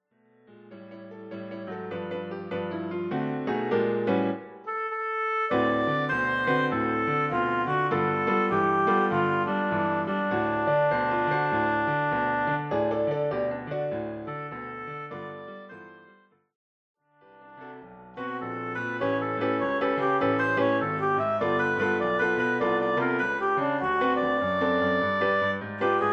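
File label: Oboe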